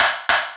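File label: snare lo-fi, drum machines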